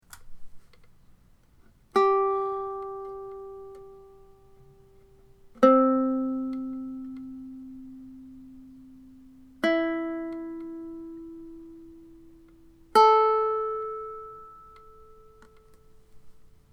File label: Elm walnut concert, ukulele